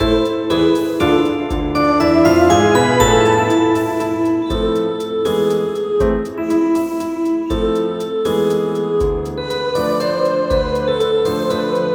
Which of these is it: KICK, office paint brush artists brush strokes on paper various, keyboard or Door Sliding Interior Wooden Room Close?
keyboard